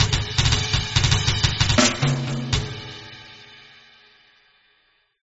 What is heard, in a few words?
mus lose